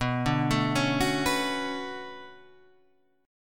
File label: B Minor 9th B, chord